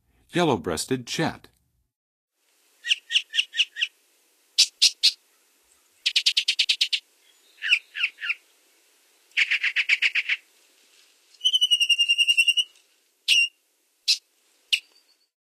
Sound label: Yellow breasted Chat, Songs of Birds of North America; Western USA; Western USA Songbirds